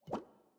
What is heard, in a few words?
Bubble Large Blow